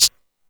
Shake, Yamaha DD-20

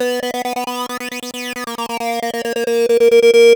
Kaossilator gated (130BPM); musicradar

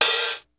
hi-hat; InVision Interactive; 40 Oz Phat Slammin Hip Hop

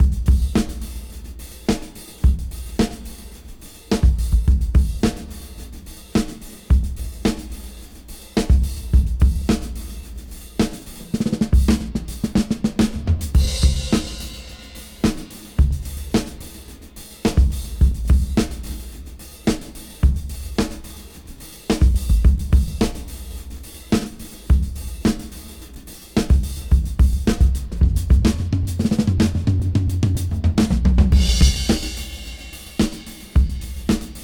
Rap Dry 106 BPM Rev; AMG - Keith LeBlanc Phat Butt Jams

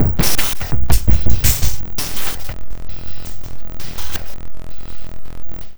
TOP LOOP R; USB Soundscan vol.33; AKAI; Disjointed Textures

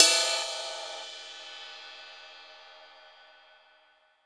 RIDE, House Side Of 2 Step; USB Soundscan vol.51; AKAI

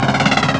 chopper, Amiga Soundtracker; ST-XX Amiga Soundtracker Sample Packs

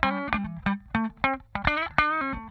TRICKY, Funk Guitar; 90 s Sample CDs; Zero G

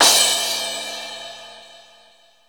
CRASH RX5; Greytsounds VOL-1

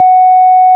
ORG, Farfisa; Roland LCDP09 Keys of the 60s and 70s 1; Farflt DBars

